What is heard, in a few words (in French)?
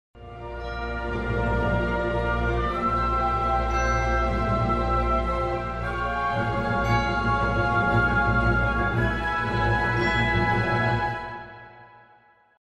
pedale; cars